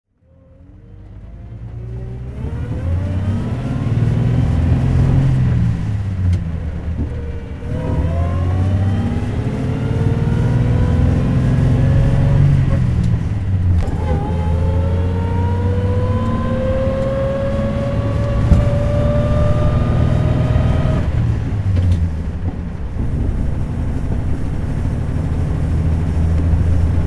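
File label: sunbeam 20hp 1927 driving, engine sound driving; Sunbeam 20hp 3-Litre tourer; V3D/ ClassicarImages; Marc Vorgers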